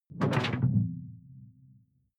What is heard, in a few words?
sci-fi alien bloom; swooshes-swishes